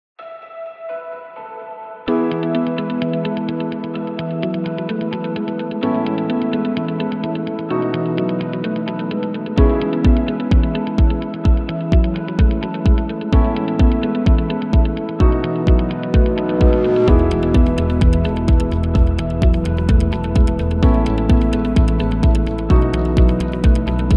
Upbeat Corporate Uplifting Motivational, corporate music; corporate music vol 15